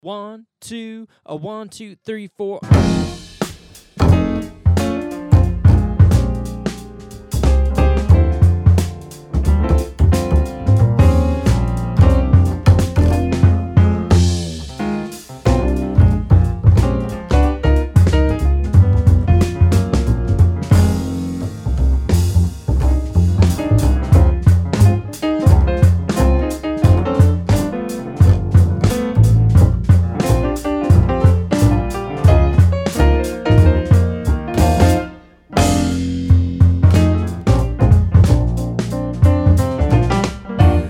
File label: No Trumpet, Play Alongs, Quartet